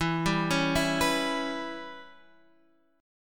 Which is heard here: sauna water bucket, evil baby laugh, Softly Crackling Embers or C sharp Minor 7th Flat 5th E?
C sharp Minor 7th Flat 5th E